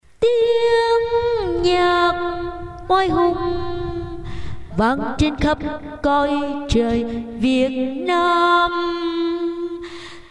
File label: alto